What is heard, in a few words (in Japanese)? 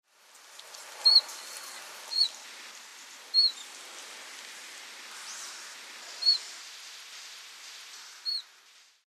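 chifuchafu, chiffchaff; nishinomiya; koe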